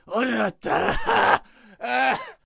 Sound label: Samurai Death Vocals